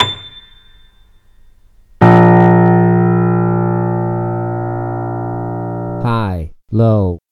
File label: High Low Extreme a6 a#0; total beginner ear training